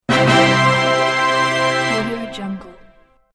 ta da orchestra fanfare

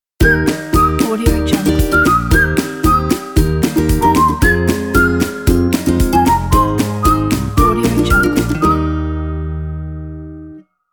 game logo; hitslab

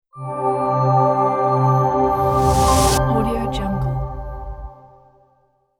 modern logo reveal

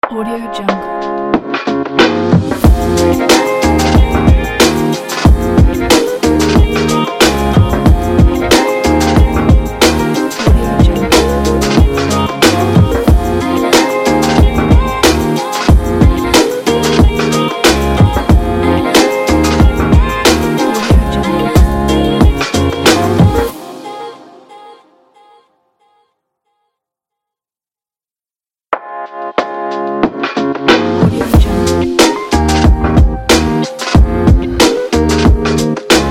urban beat

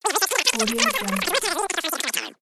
rewind

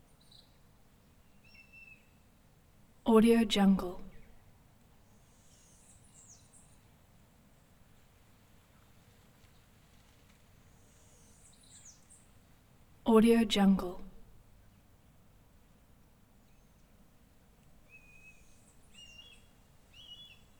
jungle dawn

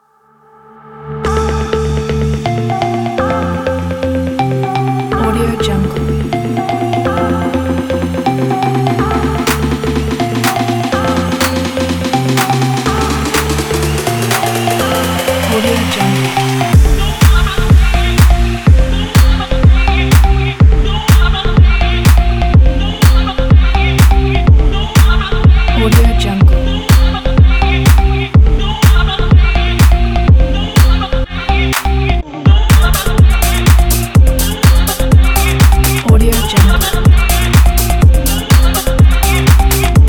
deep house

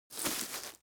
foley step grass turn

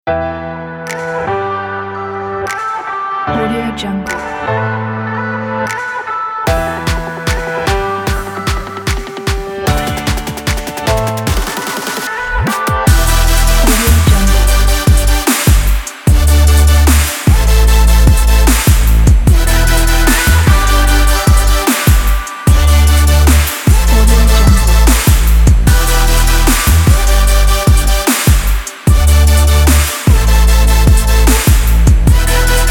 future bass

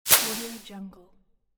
match

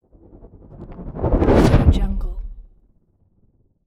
spinning flaming whoosh

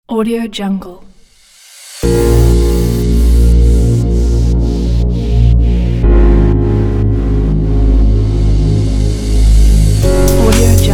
light lounge

